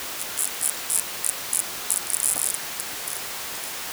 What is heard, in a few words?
pond